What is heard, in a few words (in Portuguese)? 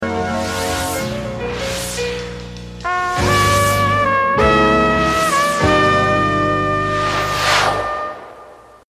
vinheta de abertura; super cine